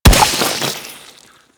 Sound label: Chem Strike Nox Rifle Projectile Explode